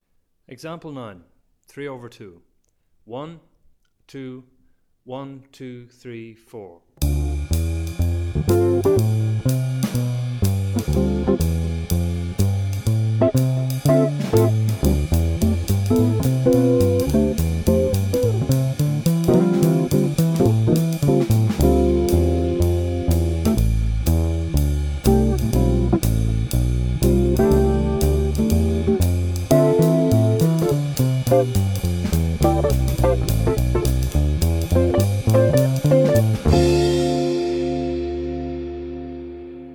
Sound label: TRIO